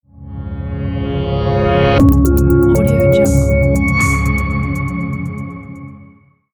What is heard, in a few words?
ambient reveal ident